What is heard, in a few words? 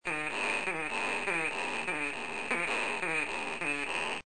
striped possum crabbing; Striped Possums